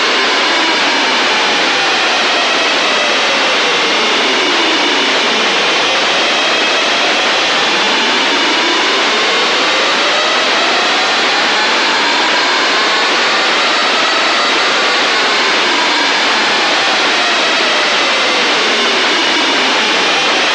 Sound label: proba v 2235MHz